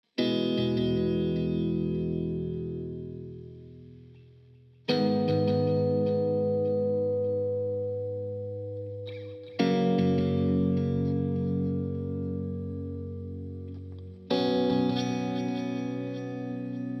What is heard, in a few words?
Soundtrack Master Audio Elec One Note